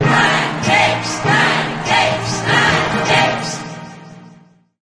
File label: arena jingle; pancakes